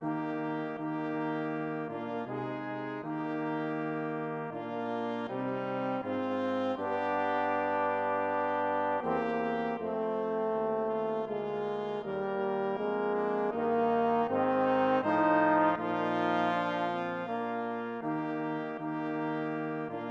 morning prayer brass quartet

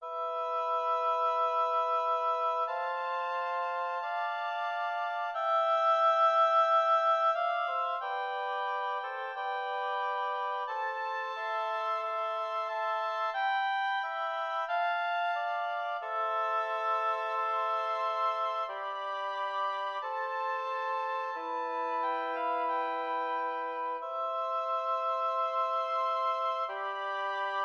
oboe quartet; mille regretz

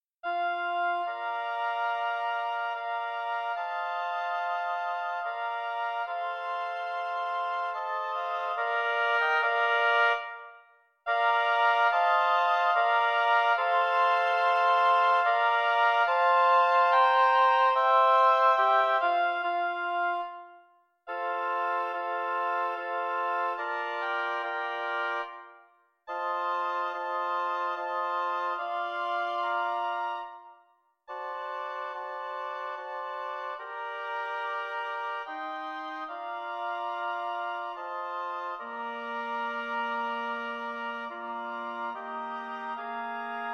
oboe quartet; Abendruhe mozart

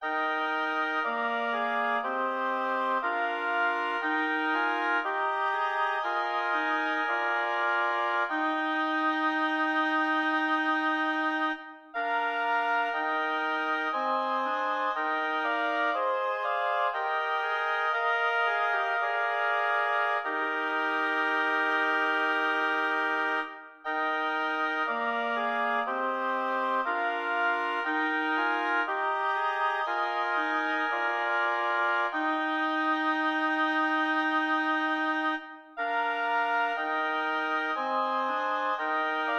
lyrical oboe quartet; no6 bach

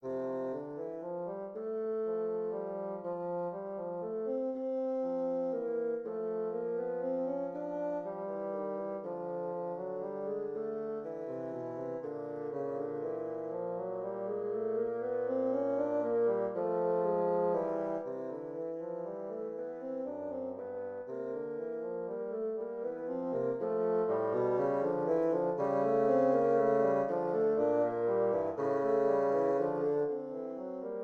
study, 2 bsns; J.J. Friedrich Dotzauer

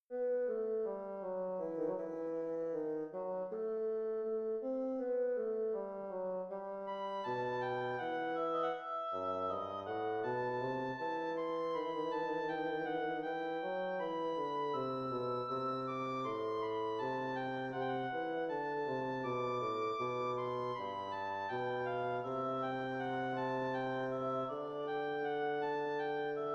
duetto ob bsn andante

